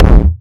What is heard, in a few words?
Dish, Kik, One Shots; Sonic Foundry (Sony Creative Software) - Future Retro Dance Excursions